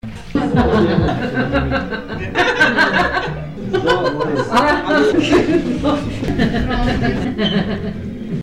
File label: laughs